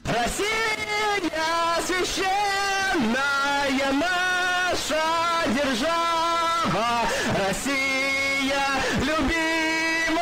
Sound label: sings the russian anthem ear rape